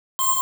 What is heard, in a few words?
VOCAL 130BPM delay; SONIC ELEMNT; SONIC EL YES